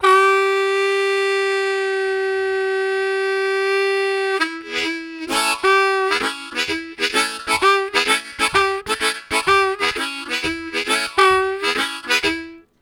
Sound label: Long Note, Harmonica; Zero G